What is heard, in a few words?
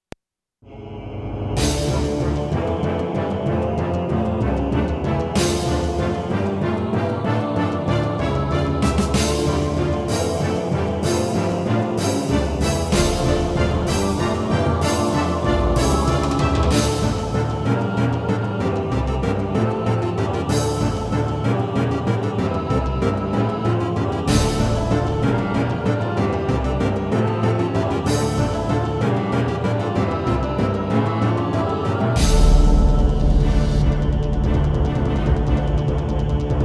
boss battle, midi; games